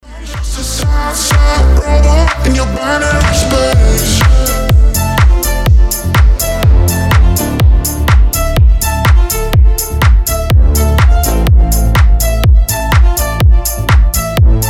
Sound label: sad robot